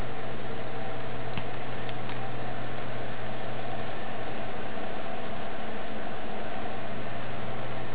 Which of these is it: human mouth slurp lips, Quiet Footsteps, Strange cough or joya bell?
Strange cough